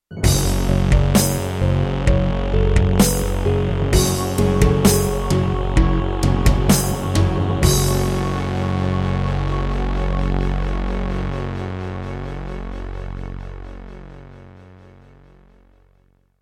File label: Introduction, Yamaha MU90; Doom (Sigil)